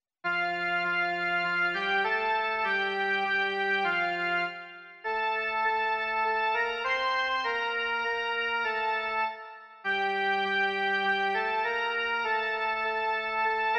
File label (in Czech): jen melodie; Bohoslužba začíná